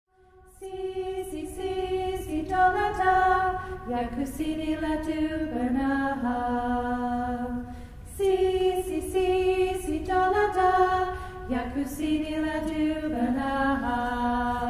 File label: Si Si Congolese folk song; Banaha